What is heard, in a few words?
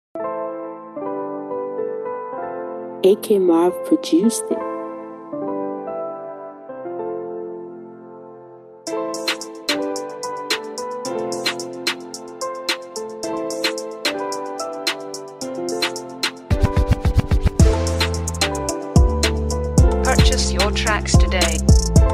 Afroswing Instrumental